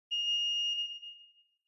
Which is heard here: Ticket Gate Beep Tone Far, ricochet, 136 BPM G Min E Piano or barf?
Ticket Gate Beep Tone Far